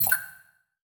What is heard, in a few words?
Flash Blurp; Multimedia Internet CD-Rom Flash